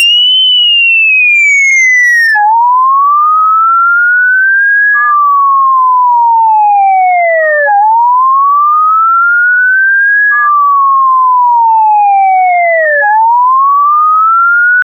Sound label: polive, siren